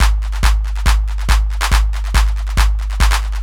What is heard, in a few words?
hard beats 140, hard trance beats